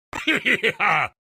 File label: Hee hee hee haw sound